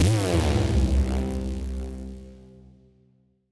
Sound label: Siren Horn down, Ramp Down, C; future rave